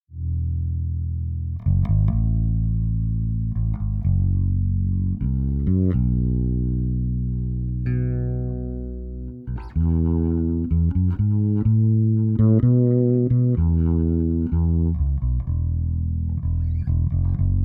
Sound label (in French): guitare basse reglage bass avec 7db